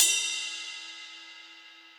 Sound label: Ride Bell Cold Tight Room; Drums; Drum Hits; Standard Hits; Ableton; Session Drums Studio